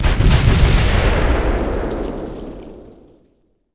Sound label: Bomb Four Explosion; Civ4 warlords